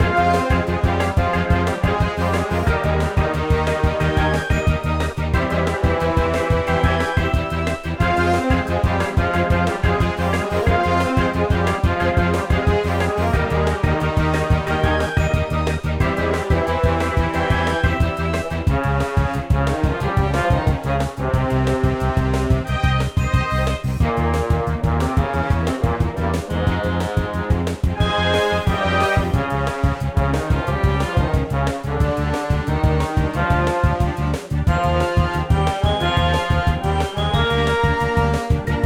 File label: fight Loop; Lithos